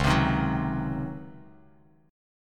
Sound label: C sharp Major 7th Suspended 2nd Suspended 4th Bass Standard; C#M7sus2sus4 strummed; 9,15,13,11; JGuitar